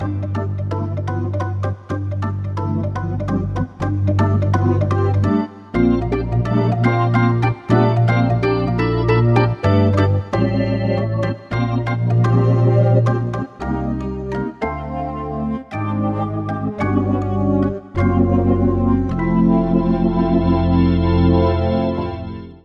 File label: Hammond B3 Samples; Controller Assignment